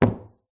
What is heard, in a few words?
phone drops on wooden table; your free sounds